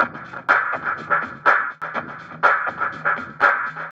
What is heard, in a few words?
beat echophonik; Sonic Foundry (Sony Creative Software); Ugly Remnants VOL-2